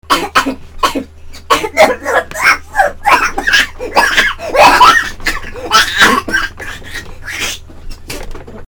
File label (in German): husten